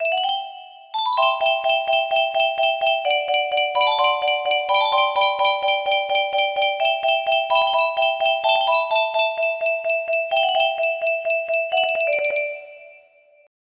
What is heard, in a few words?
Yunsheng music box; castle in the sky